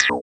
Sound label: FX EFF, ANALOG; Sound & Vision - Gigapack II